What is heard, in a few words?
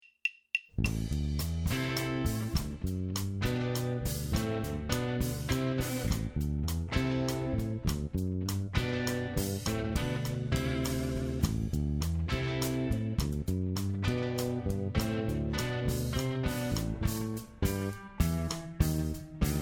Eighth Note Rock